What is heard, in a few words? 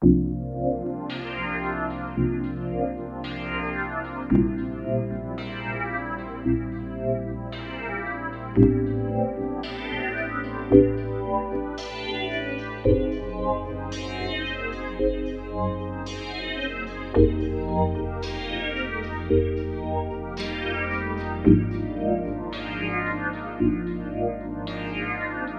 Grooving Filter; dinopark